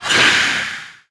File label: Soul Atk; Naiad; Gamigo